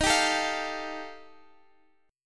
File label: G 7th Suspended 2nd Sharp 5th D sharp